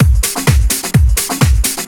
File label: Latin Loop 128 BPM